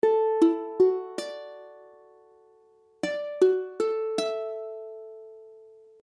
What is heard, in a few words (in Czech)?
Dadd9, akordy ukulele